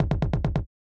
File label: Beat - Kicks, 135bpm; Zero-G Sound Sense Grime Scene; GS Construction Kits; The Hoodrat; 90 s Sample CDs